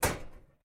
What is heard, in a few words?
Server Batary Close; Game rip; MiSide